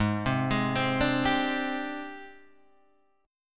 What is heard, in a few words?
Ab Major Sharp 11th Ab